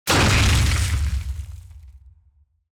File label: Impact Explosion Destruction; CB Sounddesign - Kaboom